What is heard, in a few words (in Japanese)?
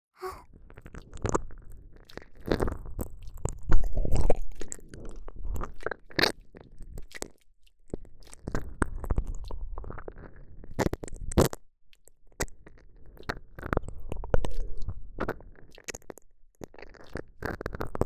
超・ぐっぽり耳舐め; 幼馴染と青春ぜんぶ入りせっくす！